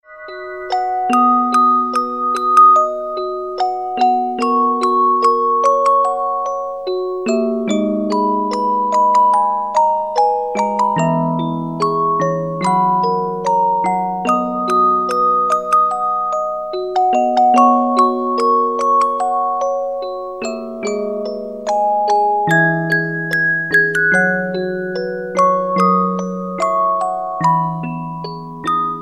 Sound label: Msicbox